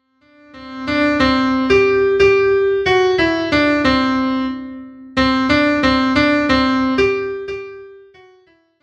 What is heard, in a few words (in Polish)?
midi kotek